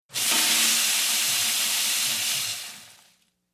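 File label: Detergent Powder Pour, kitchens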